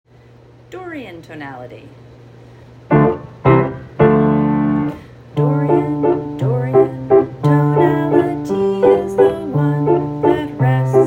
Dorian tonality

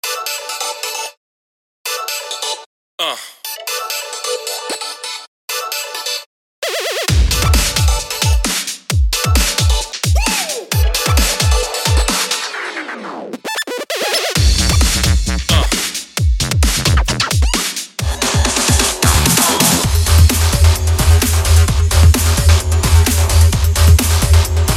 Banging Breakbeats

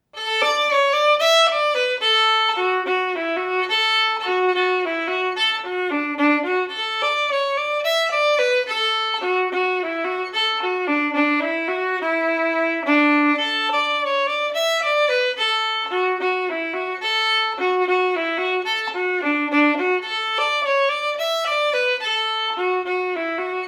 jig; Munster Buttermilk

